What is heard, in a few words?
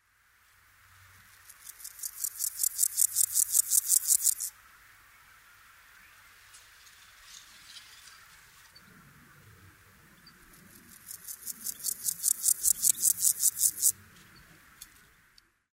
meadow grasshopper